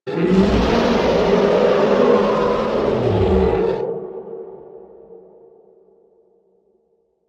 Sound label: roar; Barotrauma; Barotraumatic; Voidleviathan